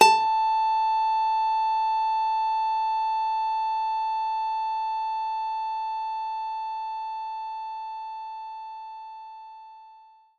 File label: Nylon Guitar A4